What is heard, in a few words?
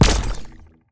funnel